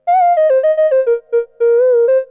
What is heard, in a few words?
classic triangle